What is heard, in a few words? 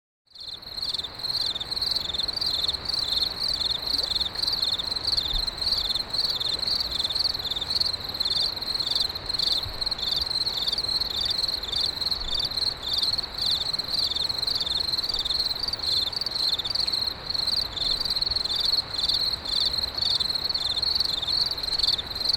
Crickets